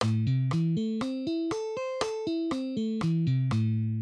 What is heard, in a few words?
Arpeggios on guitar